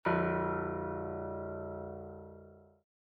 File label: Piano ff A0